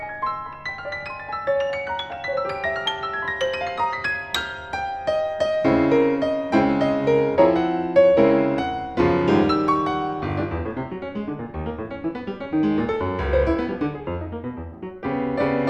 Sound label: Ballad